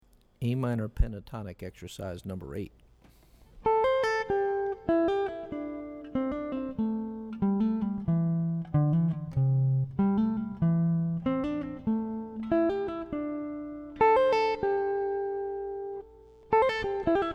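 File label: Am Pentatonic Exercise, guitar; lead skills materials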